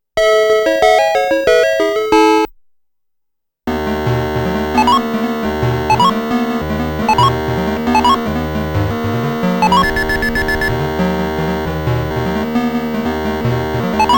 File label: Challenging Stage Start~Challenging Stage~Clear~Energy Bonus; Namco Graffiti 1; RALLY-X